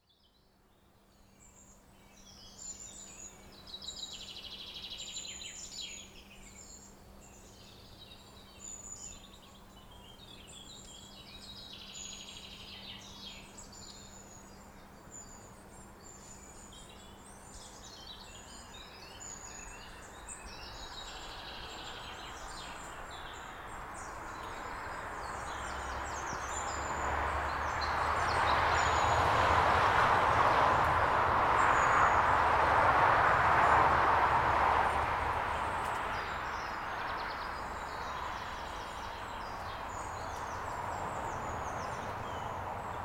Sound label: Forest Change Soundscape